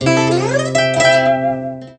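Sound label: zither toot; Musical Instruments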